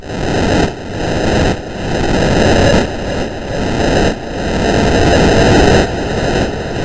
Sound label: explosion; ゆめ2っき